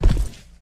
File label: SFX Footstep